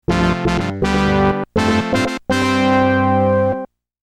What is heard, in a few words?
Fanfarre; yu suzuki produce g loc r360 virtua racing